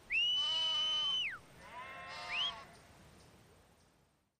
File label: Working Dog Sheep, cani da lavoro